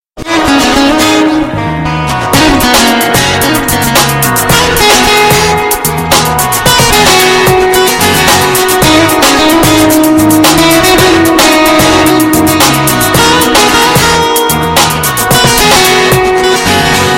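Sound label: Jannat guitar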